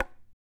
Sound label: CHARANGO FX